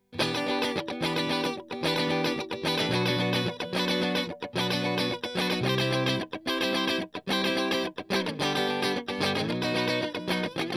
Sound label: Strum